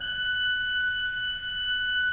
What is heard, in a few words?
F5, Cathedral Organ; ILIO; Propeller Islands Cathedral Organ